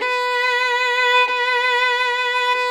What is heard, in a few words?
VLN B3; Sonic Reality; American Heartland